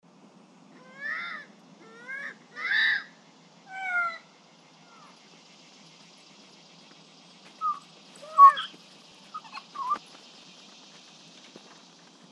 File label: Catta Playback w Chirp responses, Lemur; chirps in response to the playback; The Audible Phylogeny of Lemurs